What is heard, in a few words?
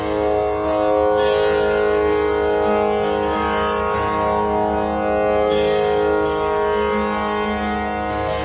tamboura loop; instruments single samples; Far East Drones and Plucks